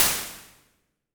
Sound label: cement blocks